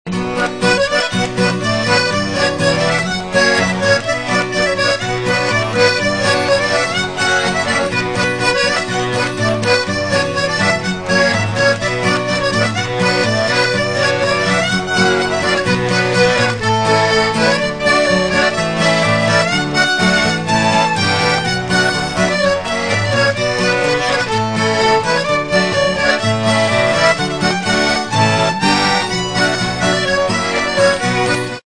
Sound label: Polka, harmonica; Happosen Viulu